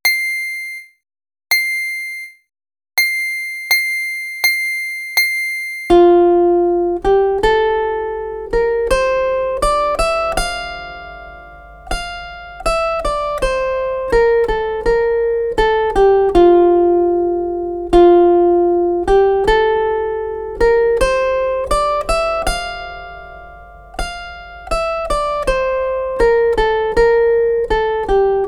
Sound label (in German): Tonleiter hoch; Playalong; Neue Zitherschule